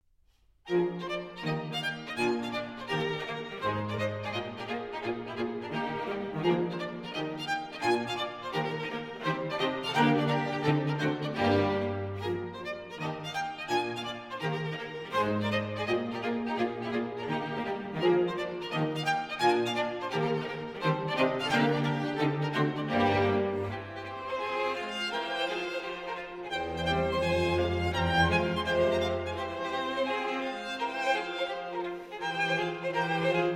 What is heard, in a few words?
String Quintet in E Flat Major, Allegro Giusto; Op. 97, B. 180; 4. Finale